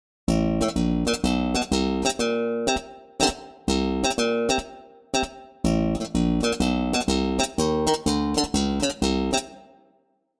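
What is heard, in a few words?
Chord Chucks, Trip-Hop; Slap Bass; SS Masterclass; Damper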